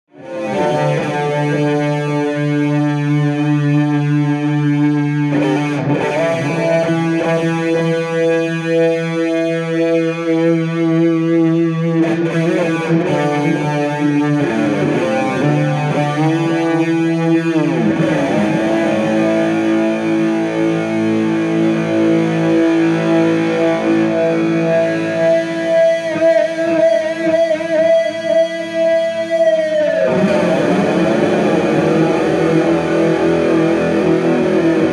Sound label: SORROW INTRO, Strat